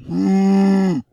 minothaur damage; server-nalc; mobsounds

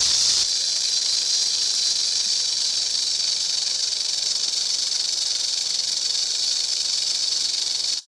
Rattle Snake; server-nalc; ambience modpack

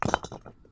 brick step; dfcaverns; hunter statue

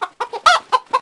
chicken; server-nalc; mobs-master-TenPlus1

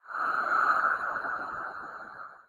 mobs mese monster; server-nalc

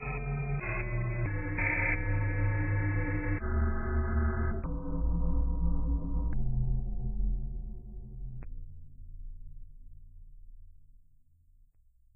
shutdown; server-nalc

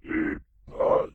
mobs dungeonmaster; server-nalc; mobs-MFF

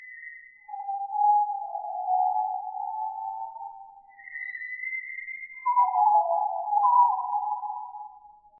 bird noise; dfcaverns; ambience

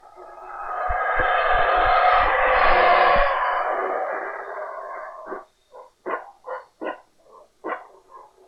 howler monkey; ambience; dfcaverns

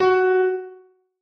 noteblock f sharp; mesecons

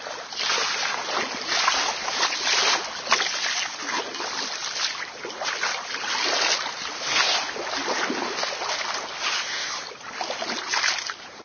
water swimming splashing breath; ambience

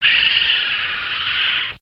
raccoon; ambience

